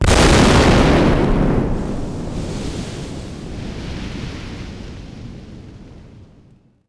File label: explo loud; impacts explos